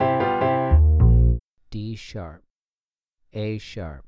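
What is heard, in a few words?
Acoustic Bass 2 Note Melodic Major d#1 a#0; melodic ear training; melodic ear training for musicians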